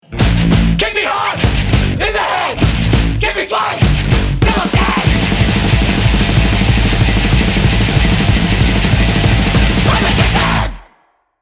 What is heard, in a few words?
Kick; sangwich heavy